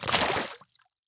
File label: water walk